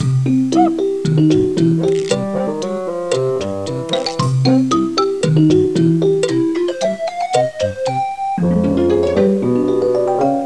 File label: Ballad; MOBICLIC